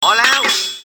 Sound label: cartoon